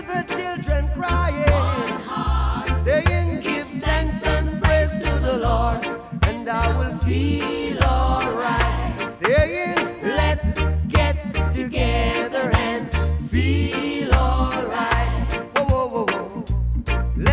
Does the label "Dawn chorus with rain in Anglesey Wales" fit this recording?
No